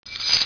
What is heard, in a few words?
Shaker Metal Close